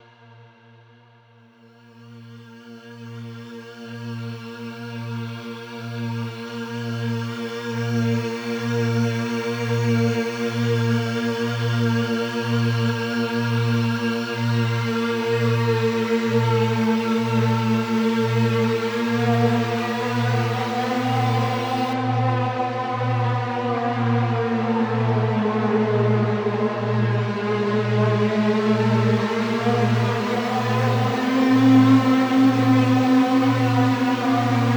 Mooer R7 X2 D7 X2 Synthie

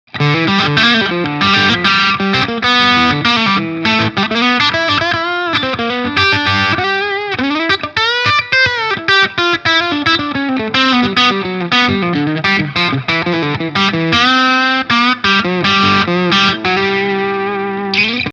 Deluxe verzerrt Steg Singlecoil; D´Angelico

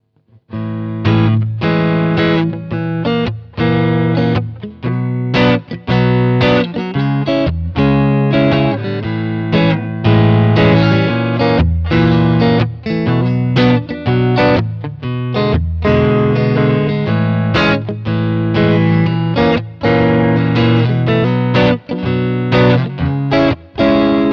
Hals crunch

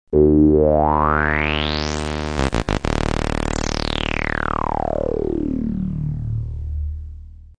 resonanz

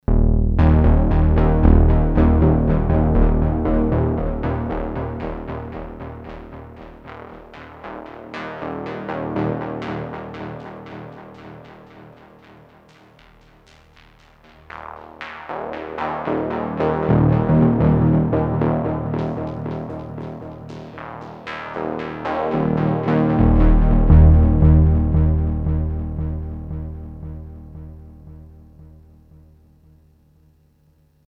Filtertest HighPass 4pol

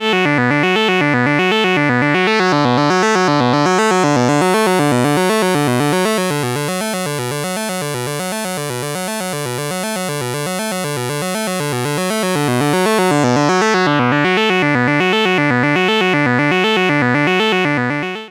12dB SVF 12db LP FM Sinus gleicher Tonhöhe moduliert Pulse Saw; GRP Module

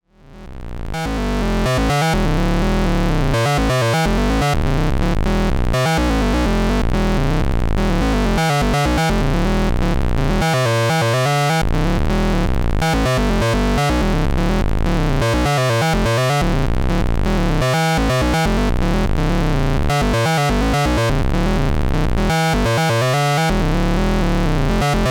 Xaoc Devices Leibniz Binary Subsystem 16tel Sequenz aus bit manipuliertem LFO

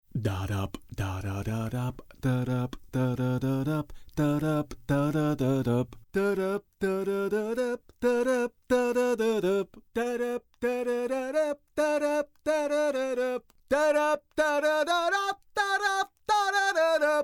Vocals EQ nach Wunsch cut

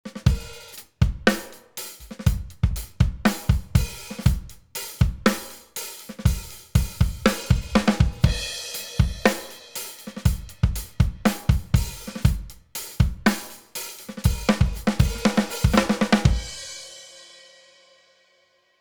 SPL Iron v2 Drums Kompressor ohne SC EQ

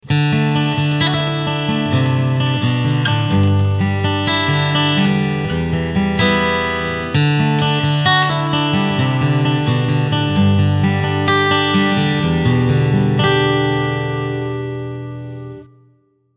Picking AKG Mikro

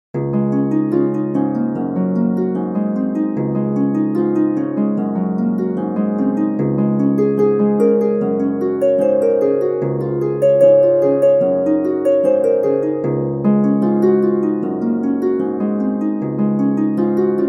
Keltische Harfe

